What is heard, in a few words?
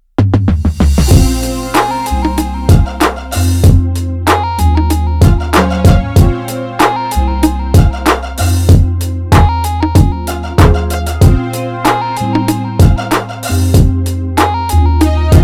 groove